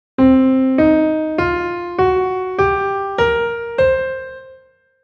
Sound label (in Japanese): ブルーノートスケール